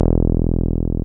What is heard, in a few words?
303, TB-303 BASS